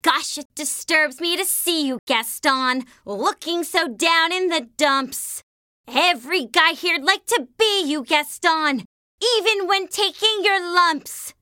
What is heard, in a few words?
Kids Song